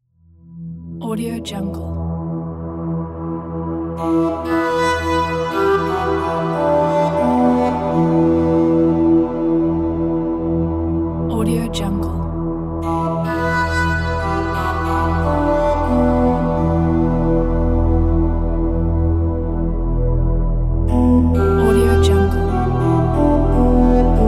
space melody

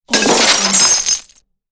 thick glass and ceramic break

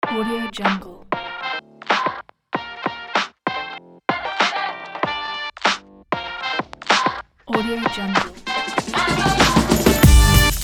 modern hiphop

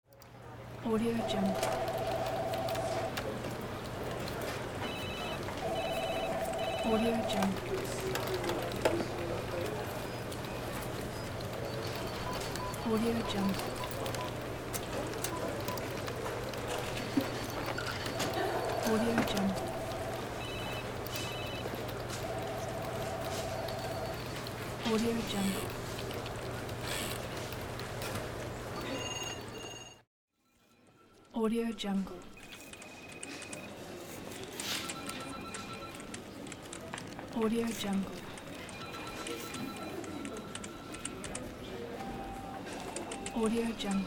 busy office ambience

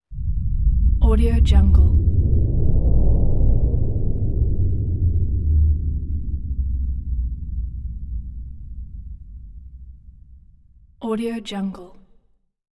slow sub woofer wind rush; hollywoodedge

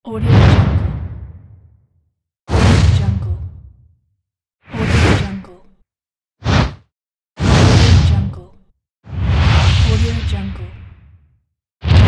fireball; elevaudio

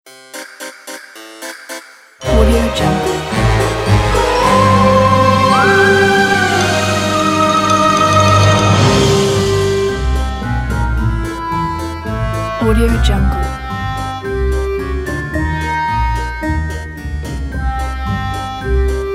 halloween

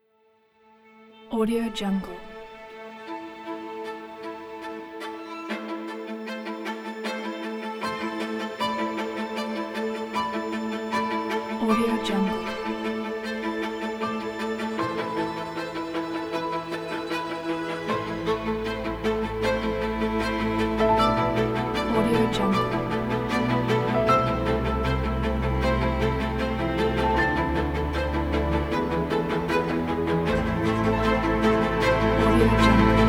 classic violins; wings of ukraine

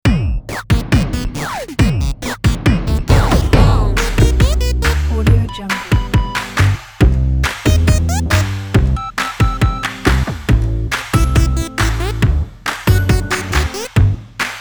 quirky electro doo wop

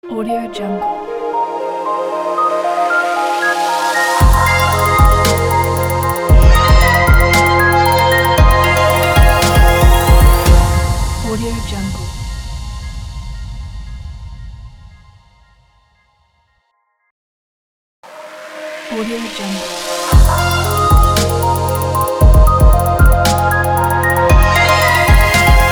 in tech logo; fassounds

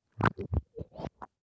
baby vocal buzz; prosoundfx

